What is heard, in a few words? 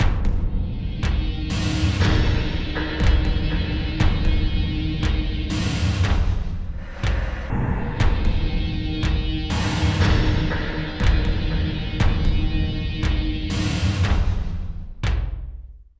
Danger Tonal; Insanely Twisted Shadow Planet